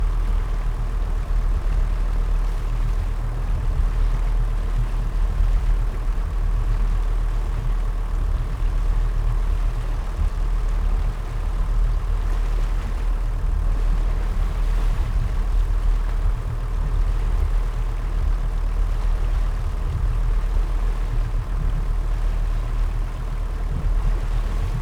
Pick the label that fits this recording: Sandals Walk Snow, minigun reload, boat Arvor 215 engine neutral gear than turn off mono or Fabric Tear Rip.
boat Arvor 215 engine neutral gear than turn off mono